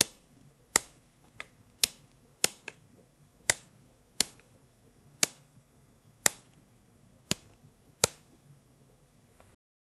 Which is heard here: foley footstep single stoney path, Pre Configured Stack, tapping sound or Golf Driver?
tapping sound